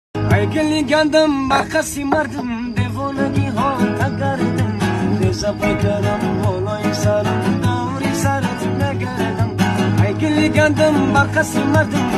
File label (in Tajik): суруди точики, tajikmusic